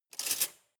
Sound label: cutlery large drawer grab